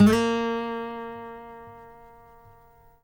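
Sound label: trill, Guitar; Quantum Leap; Guitar & Bass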